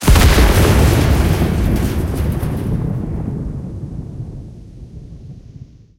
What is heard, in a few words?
plane explosion